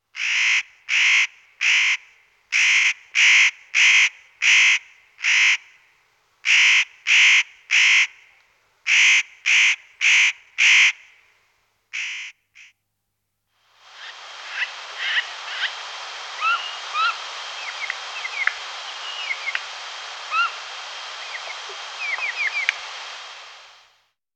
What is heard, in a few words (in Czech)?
Oresnik kropenaty Nucifraga caryocatactes